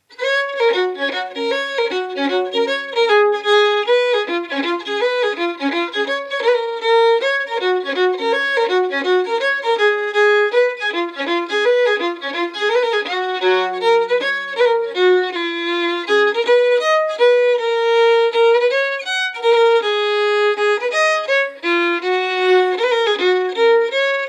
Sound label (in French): Mazurka, Violon du Poitou